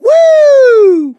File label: positive noise, mechanic, survivor, voice